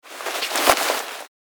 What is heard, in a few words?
Leather manipulation movement noise